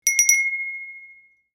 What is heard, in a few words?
bell